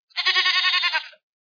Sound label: Goat Bleat